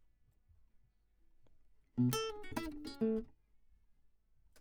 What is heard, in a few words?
Classic Guitar